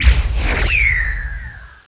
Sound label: attack; MAGIC; DuelSounds